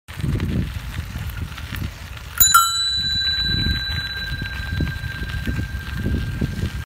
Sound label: Bike Bell